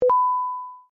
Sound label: TONE A CONFIRM, User interface feedback sounds; Tones; Sound Design